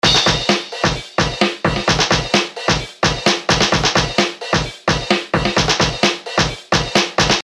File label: cyber; maoudamashii